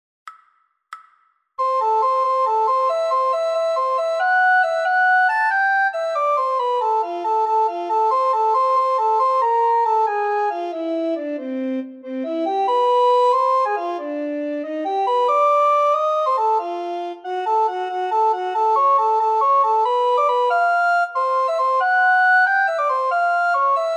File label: descant, recorder